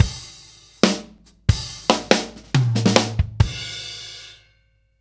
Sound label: Ballad Loop, Drum, 70s Ballad; Drum Tools